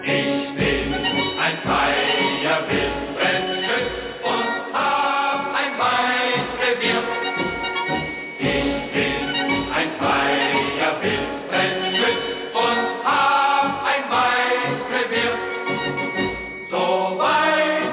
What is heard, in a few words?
german music